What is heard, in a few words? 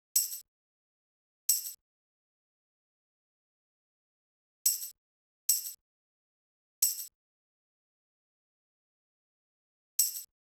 Percussion, 090 Cmin; Hip Hop; Hip Hop Exotica; Apple Loops; Big Fish Audio